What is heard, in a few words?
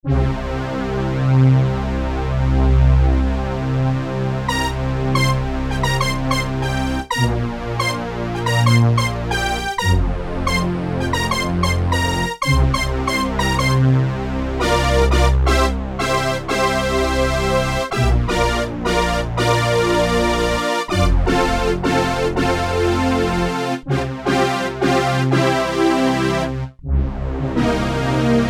fat synth; sampler; Guess the sampler game